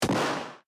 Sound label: Splat shot Big